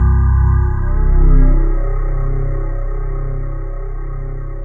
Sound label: WONDER, synbell